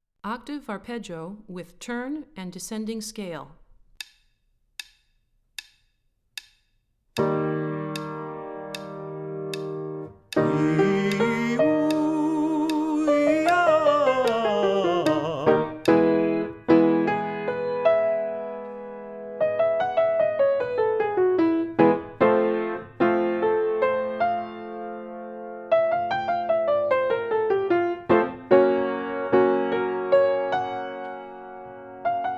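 Octave Arpeggio With Turn And Descending Scale - High, Vocal Warm-ups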